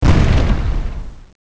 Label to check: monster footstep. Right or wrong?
Right